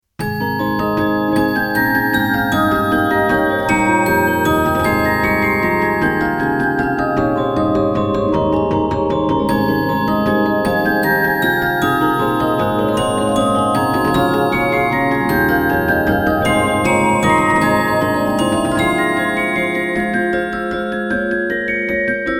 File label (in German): Cembalokonzert, Spieldosen; Bach